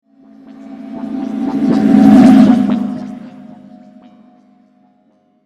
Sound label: Spaceship Pass By, Small, Ship, Science Fiction